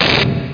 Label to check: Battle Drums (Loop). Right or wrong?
Wrong